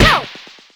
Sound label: high kick toe hit, soccer; HeavenStudioPlus; spaceSoccer